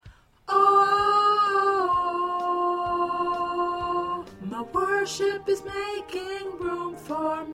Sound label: Soprano, choir